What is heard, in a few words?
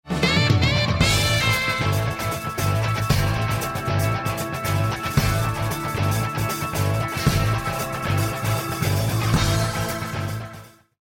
bomb ten sec count